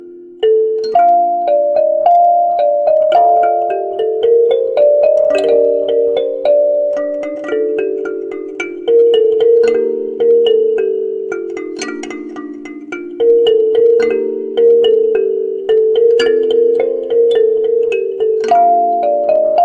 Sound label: kalimba canon